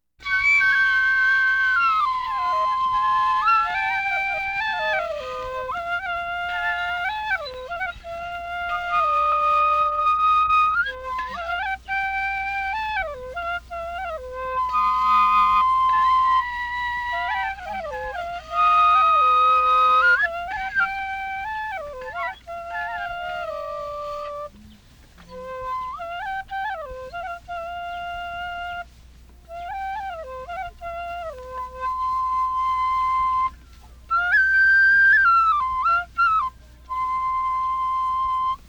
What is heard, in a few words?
Flute Duet